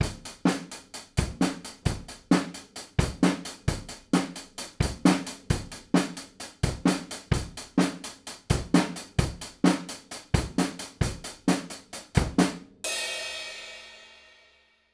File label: mic comp drums AEA